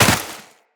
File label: Land Snow, Footsteps; Characters; Tenno